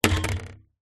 household swimming pool net pole metal down on surface